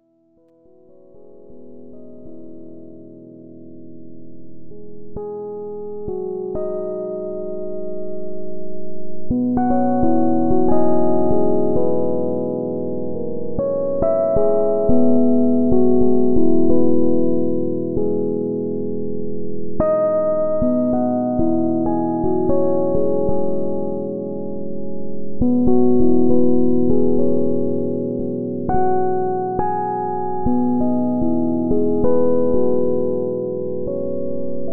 silent improvisation loop

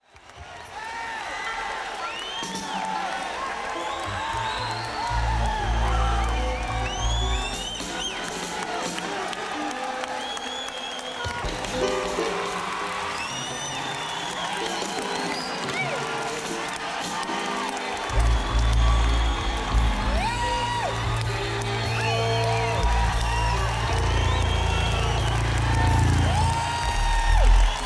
Crowd Tuning

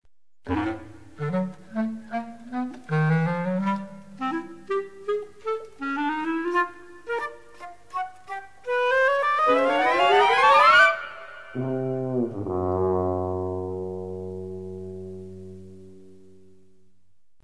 Short Bridge; Doctor Quack

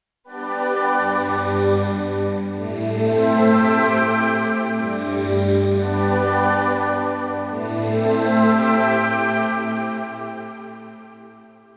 Star Choir; Kawai K1 Presets; Bank 2 card A

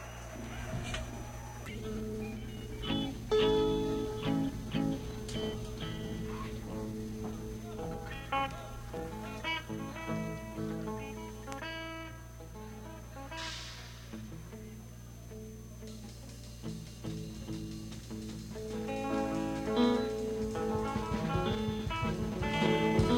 Tuning

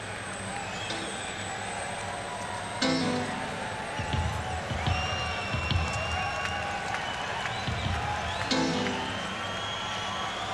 Crowd Tuning; senn me80

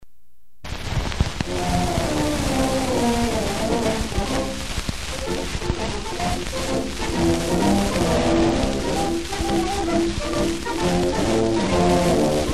Liberty Bell March; Sousas Band; John Philip Sousa

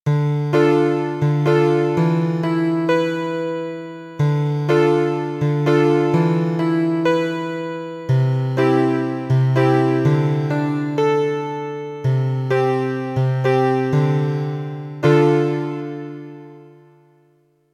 Club Piano; Kawai K1

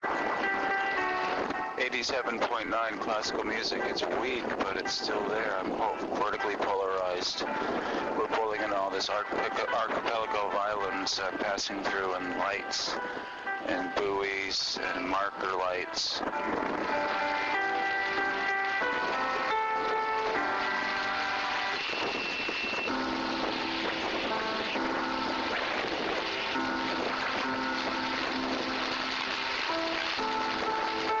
FM reception on Ferry in Swedish Archipelago; Silja Line Ferry

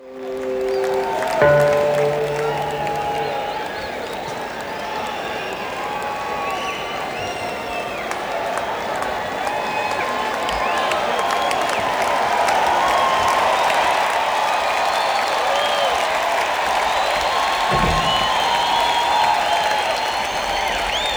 Crowd Tuning; beyer m160

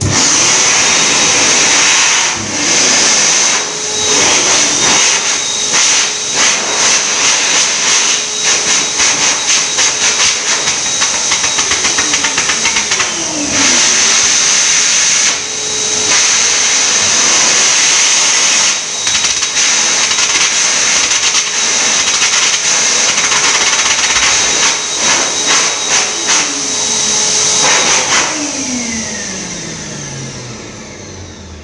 hand dryer; hand dryer solos; walthamstow; gokyuzu

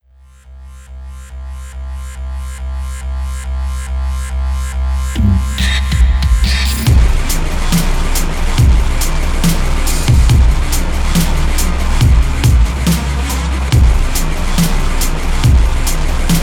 Ping

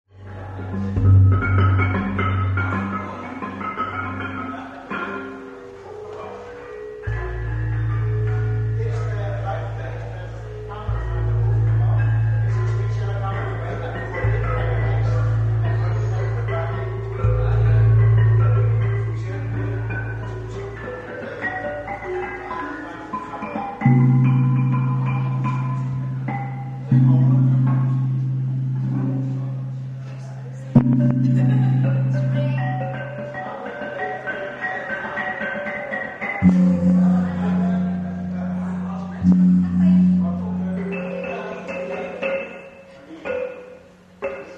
gong

